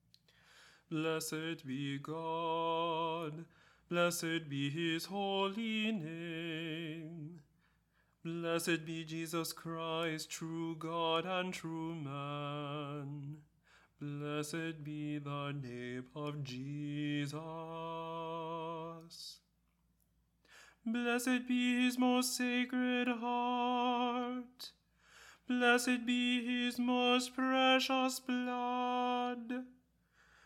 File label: Divine Praises Tenor